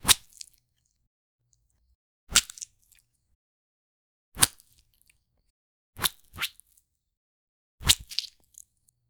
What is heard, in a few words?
slashing a ripe watermelo, fruit